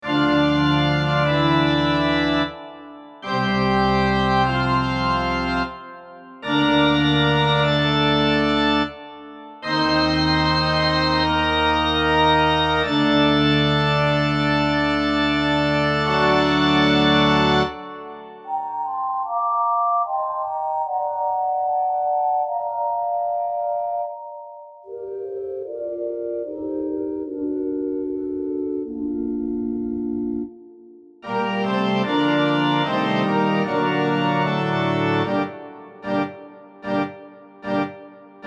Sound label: organ